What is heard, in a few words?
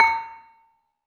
LAMELS A4, Asian Traditions; USB Soundscan vol.60; AKAI; LAMELOFO